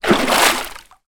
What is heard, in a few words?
water movement splash small